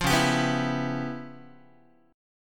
C sharp 7th Sharp 9th Flat 5th C sharp Guitar; strummed; 4A,4E,5A,5D,5G#,6C#; C#7#9b5; 8,9,10,9,8,x; JGuitar

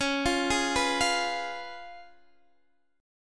C sharp 7th C sharp, Guitar Open D

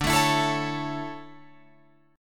D Major 7th Suspended 2nd, Guitar Open F; strummed; JGuitar

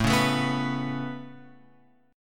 A Major Add 9th A, chord; Aadd9 Chord {5 4 7 4 X 7} strummed

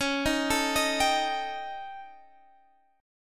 A 7th Flat 5th C sharp

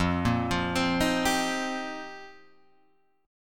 F 7th Suspended 2nd Suspended 4th, Guitar Open G

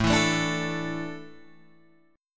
A Suspended 4th, Guitar Open G

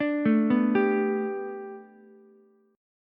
B Minor 7th Sharp 5th B Ukulele, chord; Bm7#5; arpeggiated; JGuitar

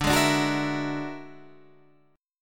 E 9th Flat 5th D, guitar

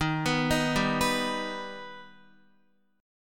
Eb Augmented, Guitar; arpeggiated; 4E,4A,5D,5G,5C,6F

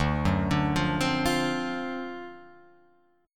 D 7th Sharp 9th D down Guitar Open F; arpeggiated; JGuitar

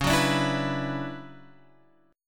D Major 13th C sharp, chord

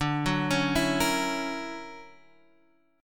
D Augmented Major 9th down Guitar Standard; D+M9 arpeggiated; JGuitar

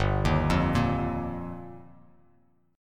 G Minor 7th Sharp 5th G down, Bass Standard; Gm7#5; arpeggiated; JGuitar

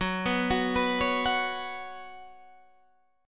C sharp 7th Suspended 4th, F sharp

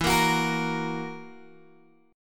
E Suspended 2nd Flat 5th E down Guitar Open D

